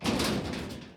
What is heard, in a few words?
Metal Small Hit; EMotional